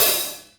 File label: Hat Semi Open Hard; hydrogen drum samples